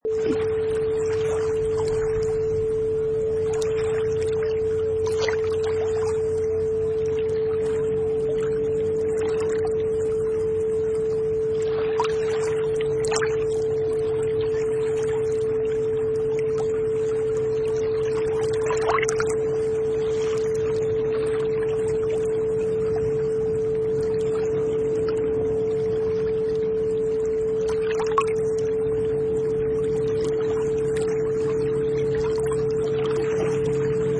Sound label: Lake Waves